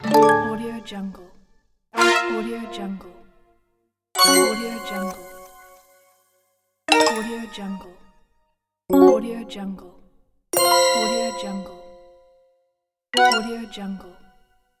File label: warning fx pack